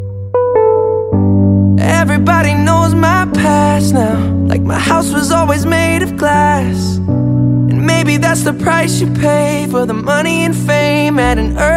ringtone english ringtones

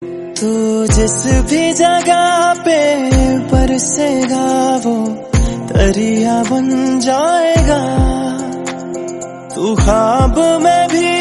romantic song tone ringtone, bollywood ringtones

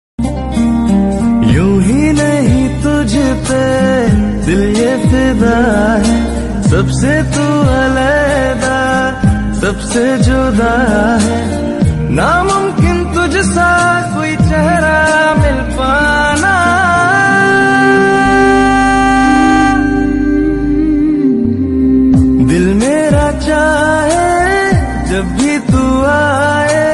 romantic hindi song tone ringtone; bollywood

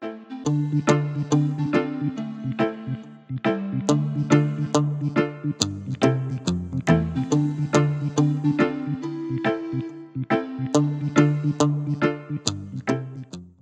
symphony

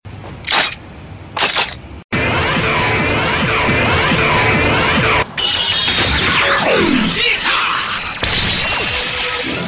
horror ringtone

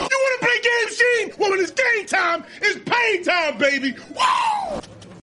games music ringtone

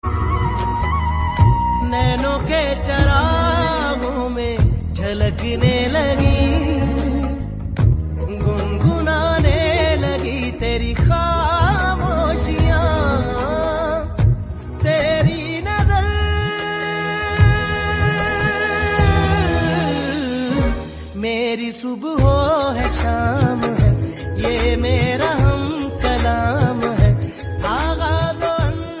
sad ringtone

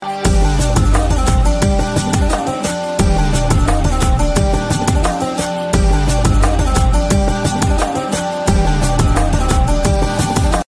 guitar ringtone; dhoom3

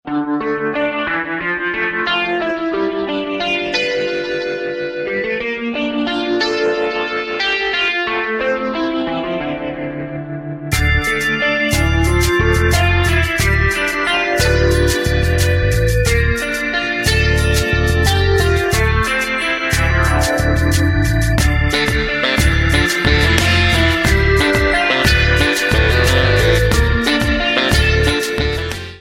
hard ringtone; apple android

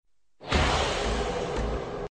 shoot ringtone

